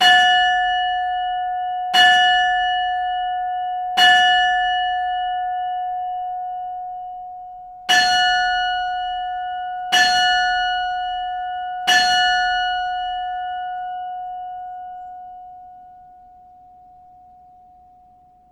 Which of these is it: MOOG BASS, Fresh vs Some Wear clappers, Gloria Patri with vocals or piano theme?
Fresh vs Some Wear clappers